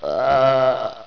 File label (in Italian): Lamento, Rutti